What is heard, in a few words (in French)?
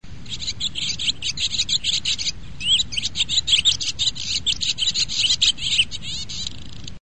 hirondelle